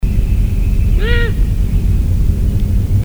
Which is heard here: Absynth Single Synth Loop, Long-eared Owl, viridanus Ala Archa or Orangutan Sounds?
Long-eared Owl